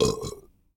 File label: burp; 1000fx